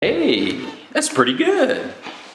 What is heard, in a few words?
win